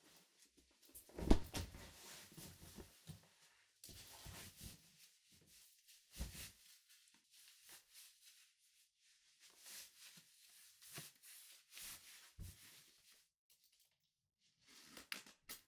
タイトスカート 履く, 色々な動作; 3DIO

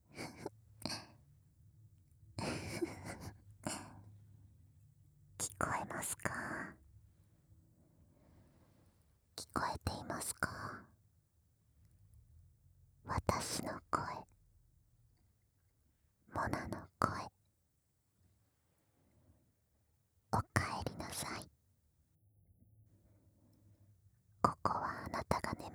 耳舐めリラクゼーション 反転

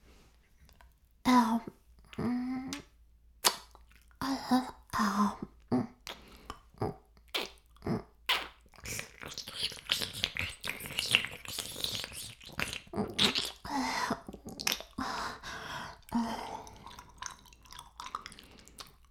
耳舐め音声 LR逆, ASMR; 白くて黒い魔性円環

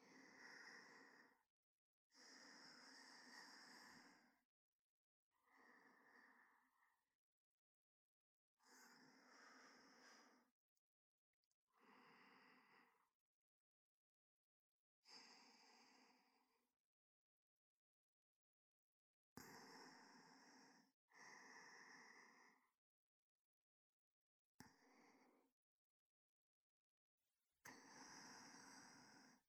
寝息＆寝言(左耳メイン); 添い寝ボイス

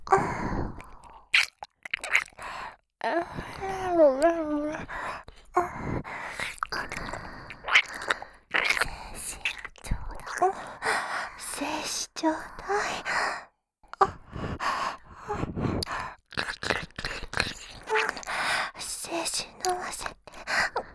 耳舐め 喘ぎ; 精子ちょうだい♪; シャルロッテ

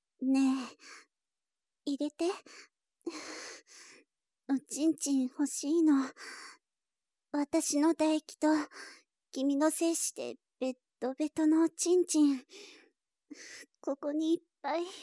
H音水音のみ・Finish音あり

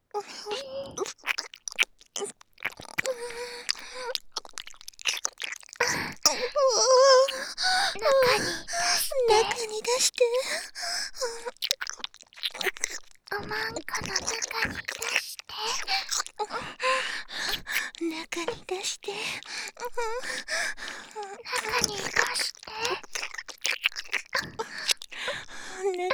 中に出して 耳舐め喘ぎ; ハーレムオナサポ 4人のメイドがご主人様のオナニーをお手伝いするバイノーラル～文、千曜、名月、莉桜～

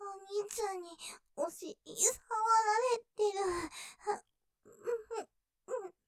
喘ぎ◆お兄ちゃんにお尻を触られて、もっと感じちゃう; ステレオ

